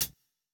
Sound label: japanese pop hihat